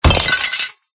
Vase Break

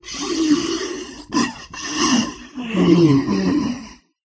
Future Groan

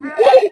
Raptor Noise

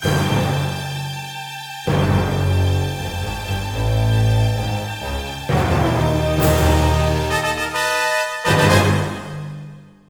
Music Boss Raid Intro

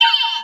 Staff Slam Hit

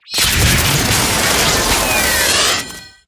Streaming Waves

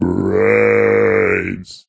Lost City Groan

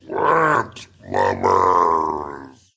Pirate Groan

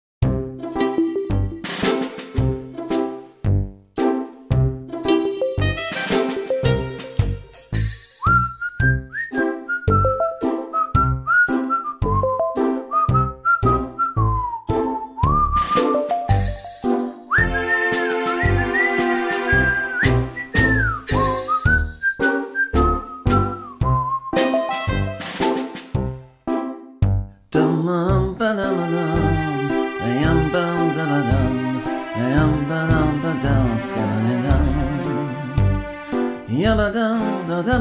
Garden Shuffle

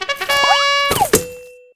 Peashooter Pea Gatling

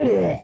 Grunt

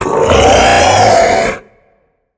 Streaming Waves

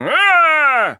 Wizard Heal Grenade

